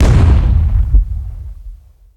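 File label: Explosion Grenade Smoke; Destruction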